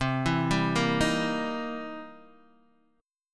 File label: C 7th Sharp 9th, Guitar, Open D